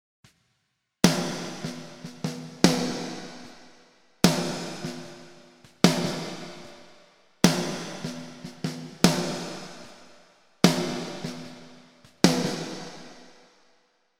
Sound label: Snare Modulation